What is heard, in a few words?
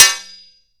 hit metal metal; Mount&Blade Warband